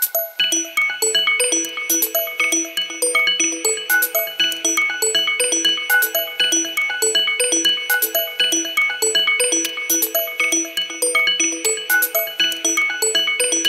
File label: Motorola Nanotubes Original Ringtone